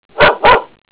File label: woof